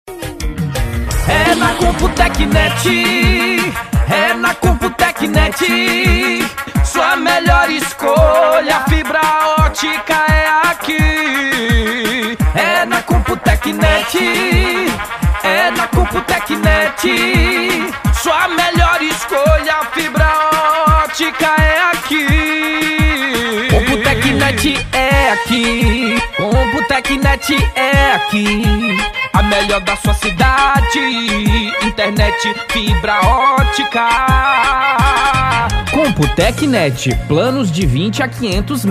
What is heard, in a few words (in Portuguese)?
jingles politicos piseiro brega pesadao; Computo Net; Jingles Para Ineternet Musica Para Provedor De Internet